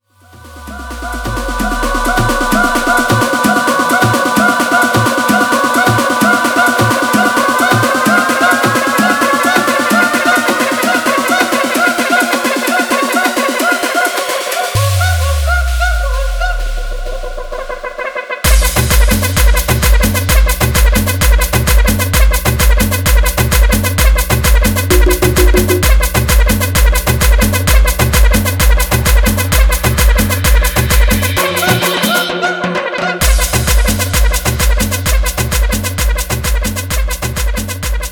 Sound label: guaracha remix 130 bpm